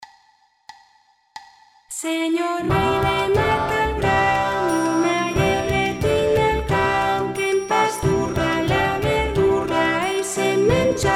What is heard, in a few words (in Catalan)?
coral SATB